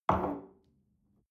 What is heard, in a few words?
espresso place on table soft, restaurant cafe